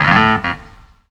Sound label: CLAVINET; Ragga & Dub Reggae